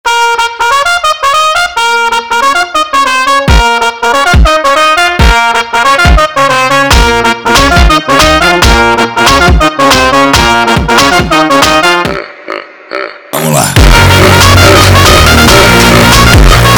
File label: Funk